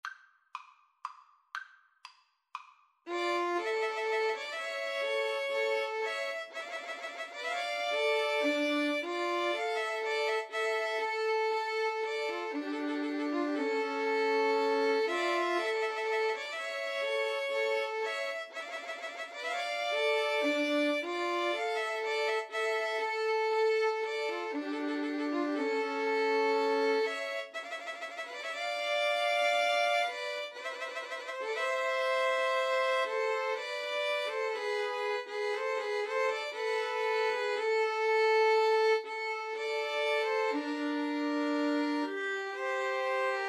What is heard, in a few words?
pastorale, 2 Violins Cello